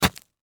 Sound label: Sports Stake Wood Stake Stabbing Dirt